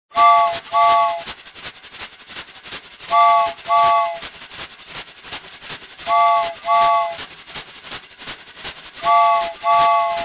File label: Cartoon train whistle; Trains Railway; Train Signals Rolling Stock